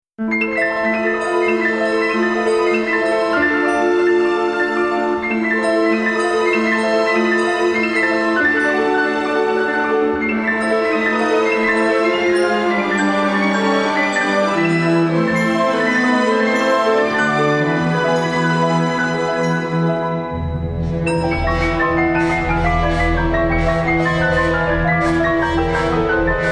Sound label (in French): Aquarium instrumentale